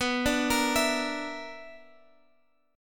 B Minor Major 7th Double Flat 5th B, Guitar, Open G